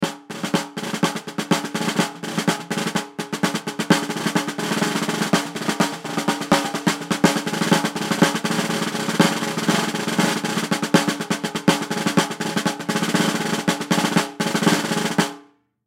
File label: Drum Snare; Snare 14 Inch Pacific Drum Roll Ditty Long; Drum Kit Sound Effects; Blastwave